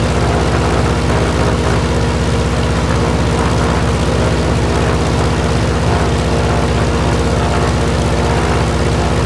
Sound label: plane; World of Tanks